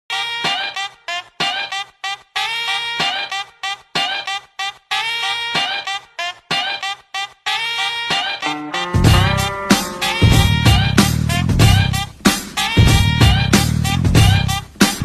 adithya varma bgm ringtone boys attitude bgm ringtone